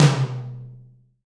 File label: roomy tom b key